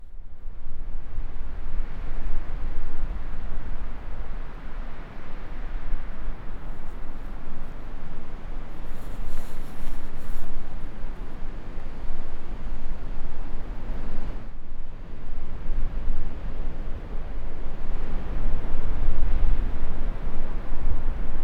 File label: Wind In The Trees